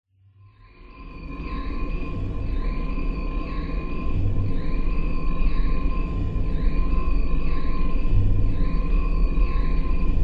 science fiction onbaord spacecraft ambience droning airy roomtone beeping whirrin; whirring